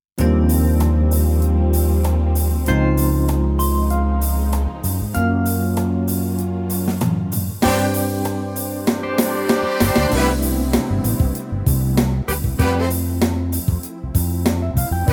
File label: C to D groove